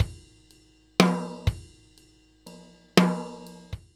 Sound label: New York Loop, Drum Tools, Laidback